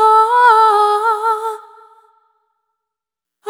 Compulsion, Vocal Samples, Loops; Sonic Foundry (Sony Creative Software) - Pocket Diva World Class Vocal Samples; Op-Erratic Loops

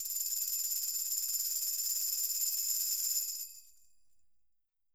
Roland MV8800 percussion

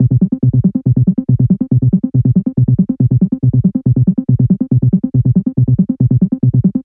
140BPM C; Vengeance - Essential Clubsounds Vol.4

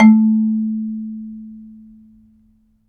MARIMBA; ILIO

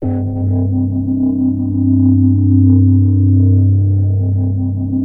Access Virus B; Synthworx

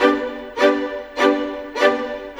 Viola, Violins, Orchestral, Rock Pop

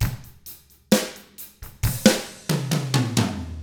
SLOW ROCK, Drums; ILIO; Double Platinum Drums 1

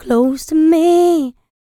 LICKS, Vocal R&B Dance; LT DANCE

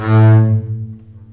CBS A2 MARCATO, String Collection; East West - The Ultimate String Collection

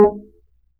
G mf, G string upright bass; Upright Acoustic Bass

